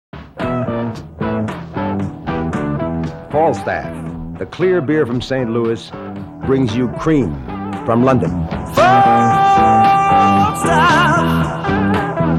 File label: Falstaff Beer Commercial